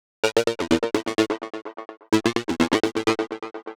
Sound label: Sickly Seq, Synths, Underground UK House & Electro; Sonic Foundry (Sony Creative Software)